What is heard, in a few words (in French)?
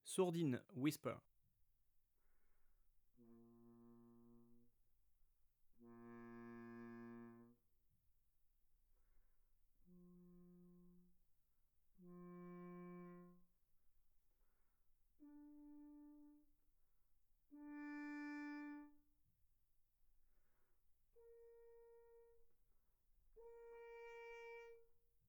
sourdine whisper, trombone; Sourdines; Sourdines fixees; traite orchestration; trombone tenor trombone basse